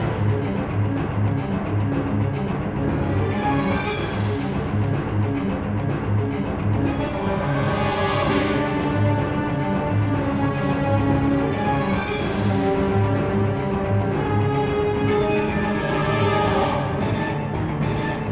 Boss Hell King Loop; Dungeon Hunter 2

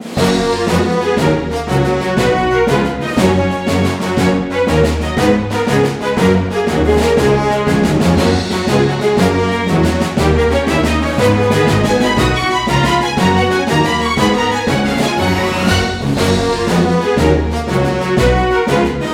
March

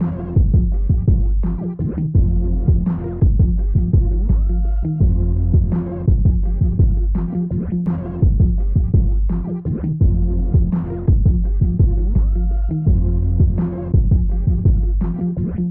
Diner Park Car Music

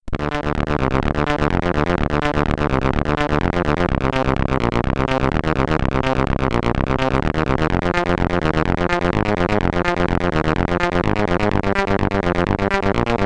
Boss Theme, SID Stereo, commodore 64; Katakis

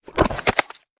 Queen Roll start

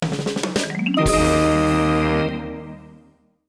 Win Sting; curious george downtown adventure macos windows gamerip 2002

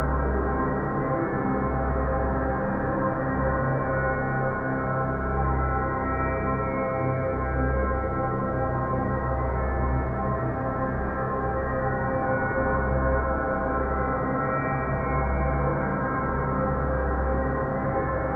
Intro Music Loop Comic; Void Bastards

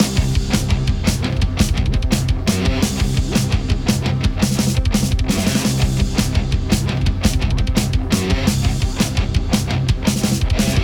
Metal Miniboss; Chilltheim

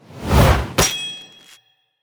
Special Big Axe; LooterKings

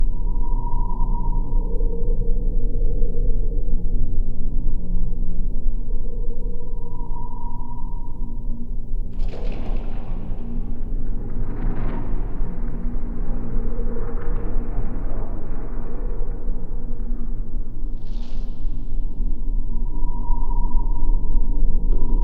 Cave 3D loop; Town of Night

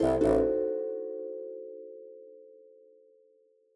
Puzzle Fail; Beetle

